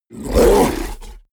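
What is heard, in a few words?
fishman groan, creatures; kreaturen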